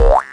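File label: Drum click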